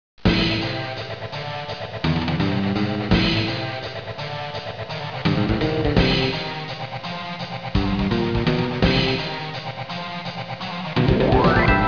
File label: boss battle music